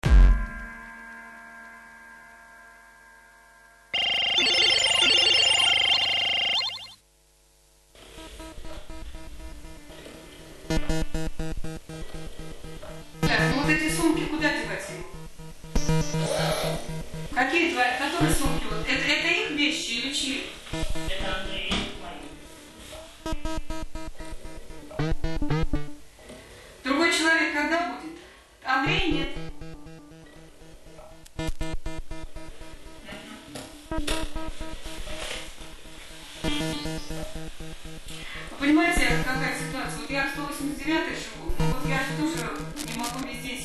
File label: electro club